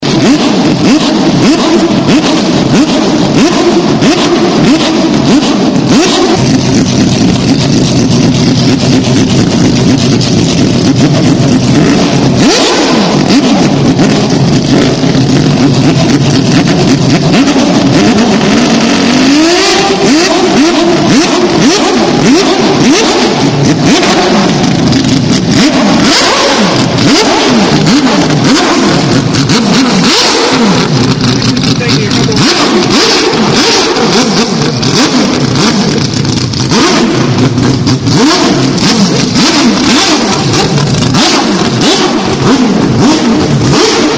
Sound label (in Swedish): brum, bil